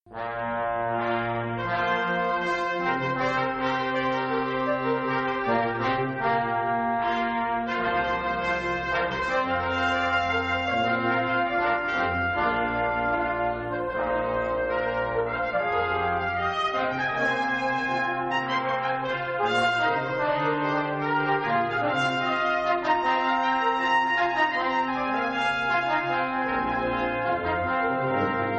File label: Fanfare, brass